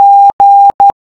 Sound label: Morse G